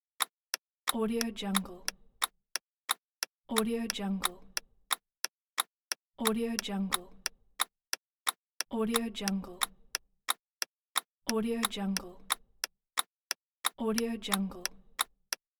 clock ticking; moonaudioproduction

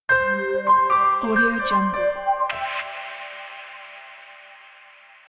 inspire logo

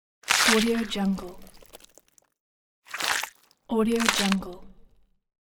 sword hit guts

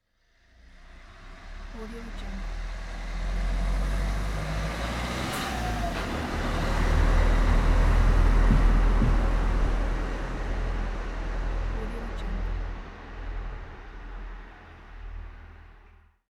narrow city alley diesel car passby

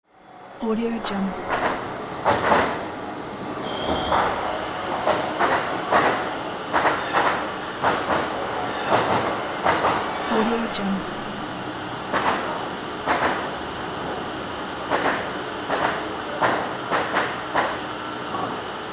workshop ambiance

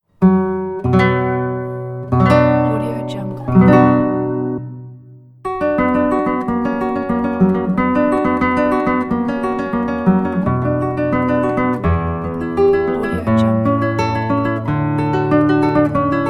guitar prelude

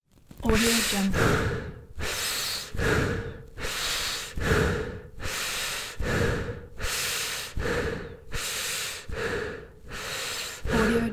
breathing through mask

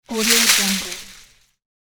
rustling bushes

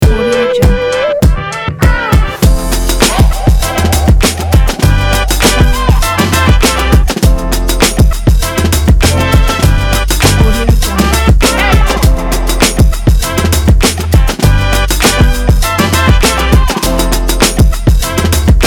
upbeat energetic summer hiphop

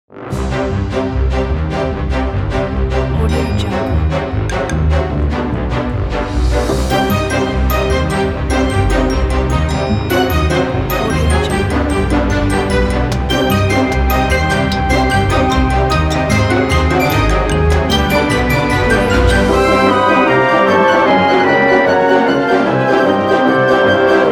quirky comedy dramedy ident

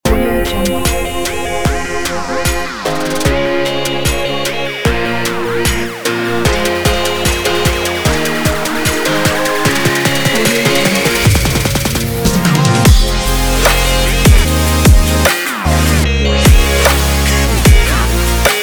future bass

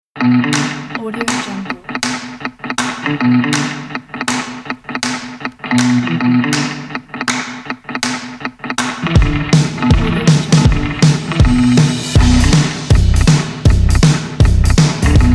vintage indie upbeat

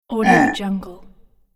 orc monster grunt

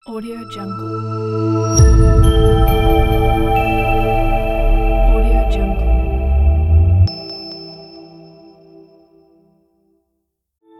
crystal sky logo intro pack; warmwolf

